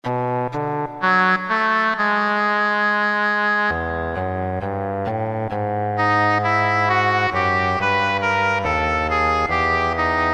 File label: Woodwinds; Roland PN-D50-03